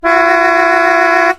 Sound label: KLAXON